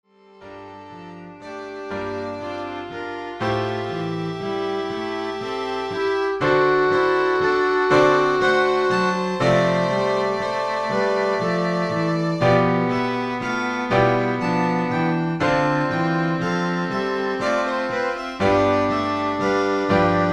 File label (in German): Quintett